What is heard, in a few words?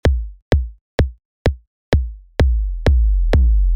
kick v decay